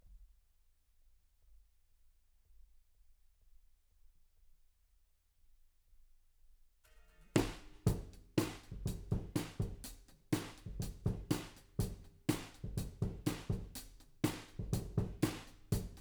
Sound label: Ride